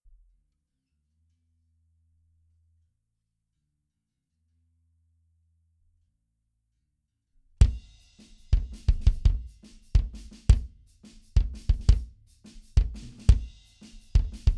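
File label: S602 BD2 mono Drums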